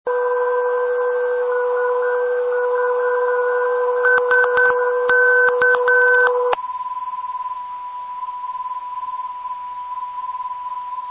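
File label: 349 5 kHz 400 24 8 Hz filter, non-directional beacon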